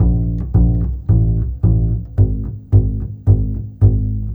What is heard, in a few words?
Bass Pizzicato, Orchestral, Rock Pop; Sonic Foundry (Sony Creative Software); Orchestral Series VOL-4; Rock and Pop; Rock-Pop 13